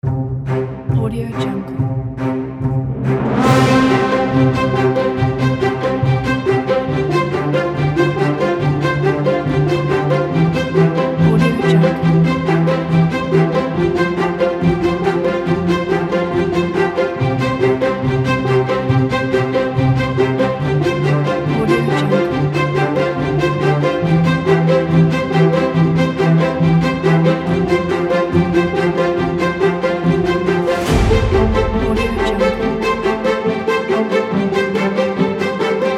celebration strings

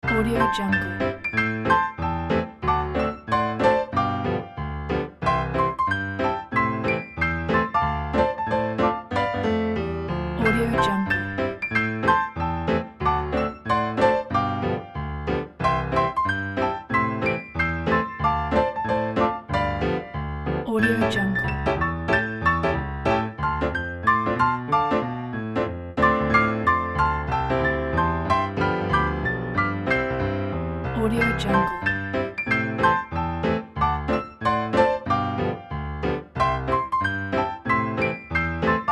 ragtime piano comedy; sonicmood

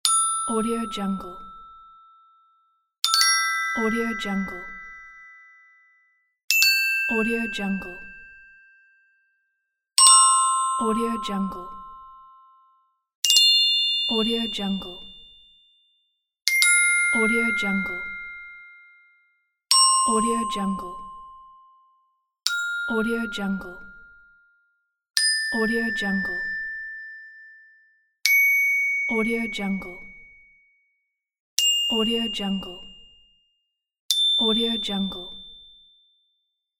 ding; kazoomx

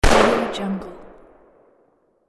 pistol blast single indoor

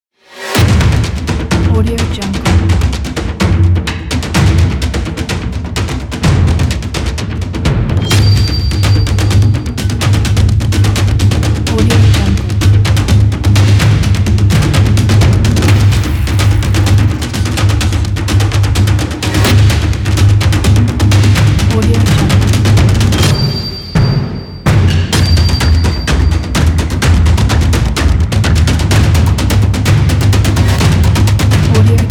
epic percussion drums

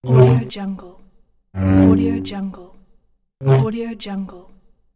lightsaber

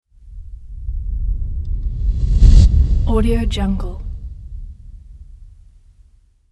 cinema swoosh

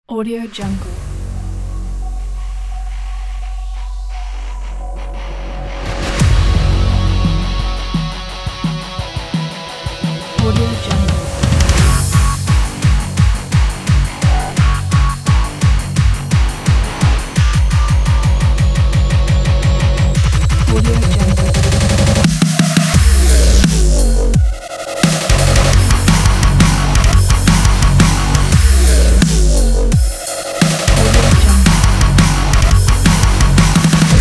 metalcore brostep cinematic trailer

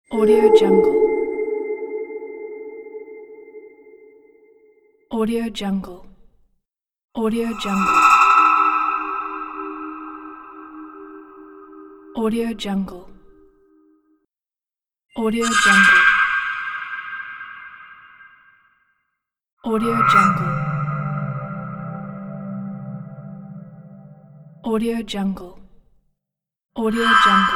magic mirror; magic mirror pack

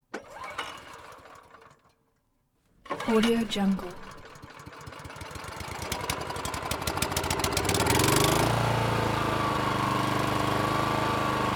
lawn mower rough start pull start

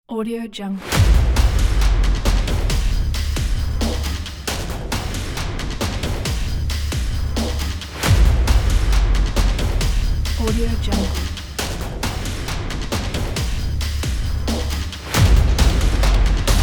industrial

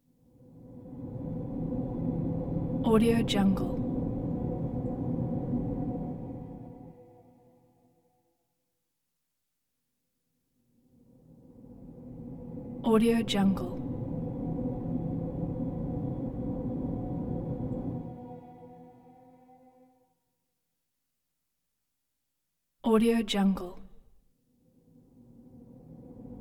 evil elevator tonal presence long synth bursts

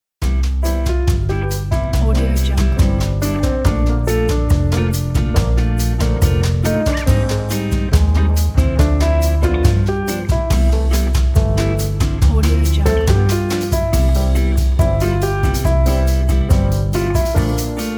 bossa nova elevator

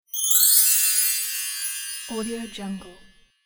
fairy glitter; volkovsound

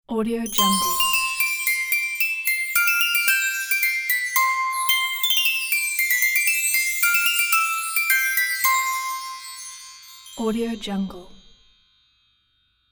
christmas logo

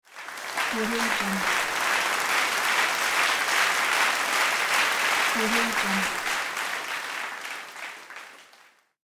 applause

